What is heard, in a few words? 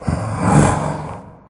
karlik make hit, stalker mutants; customnpcs